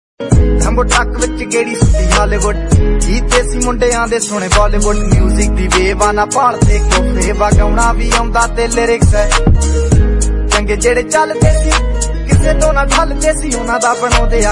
New Punjabi Song; Ringtone

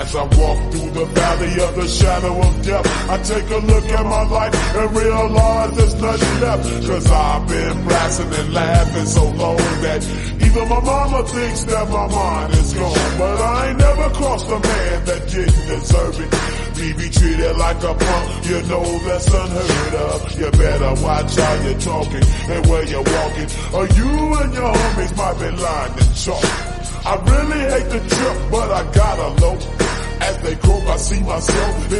Slowed English Song Ringtone

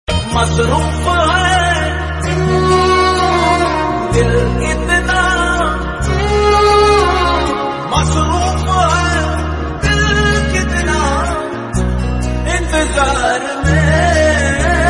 Song Ringtone

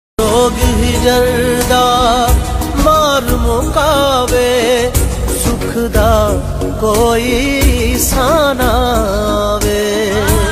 New Sad Song Ringtone

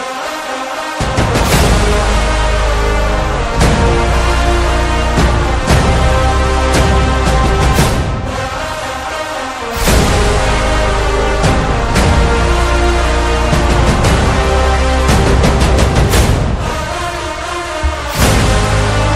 Instrumental Ringtone; Salaar

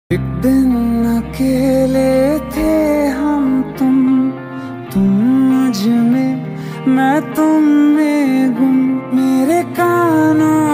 Romantic Love Song Ringtone

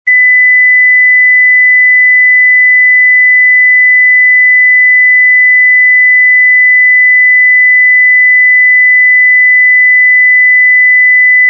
High Frequency Ringtone

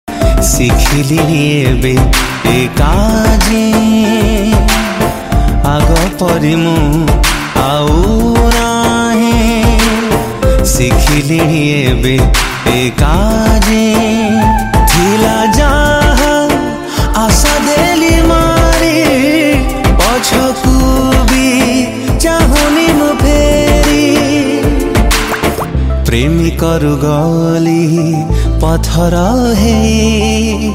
Odia Song Ringtone

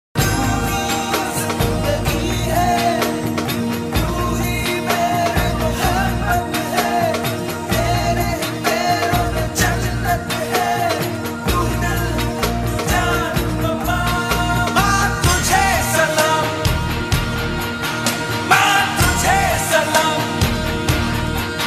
Ringtone

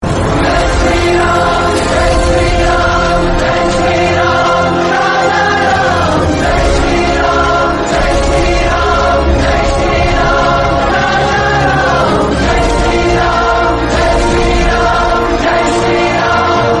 BGM Ringtone; Adipurush

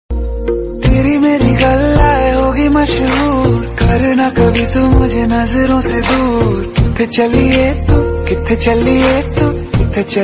Ringtone

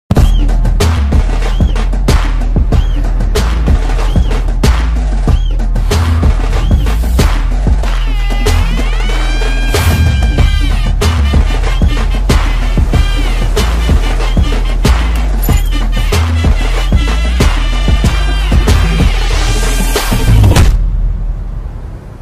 Malayalam Movie Ringtone; Solo

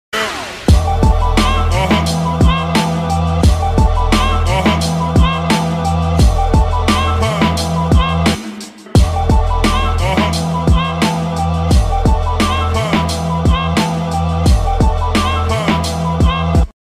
BGM Ringtone; Taxiwala

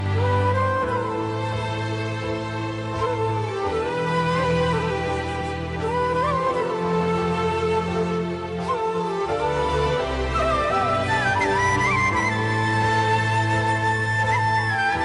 Tamil Heart Touching Ringtone; ringtones

